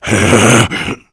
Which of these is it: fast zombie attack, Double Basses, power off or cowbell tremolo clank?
fast zombie attack